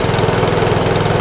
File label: generator loop; Tony Hawk's Project 8; XBOX